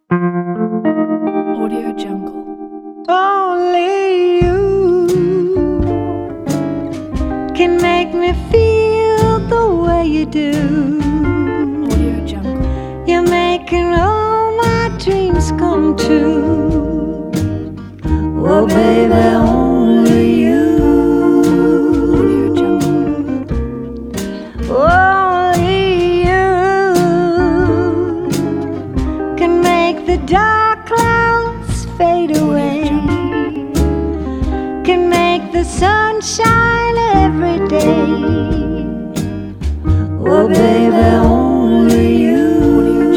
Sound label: 50s female vocal commercial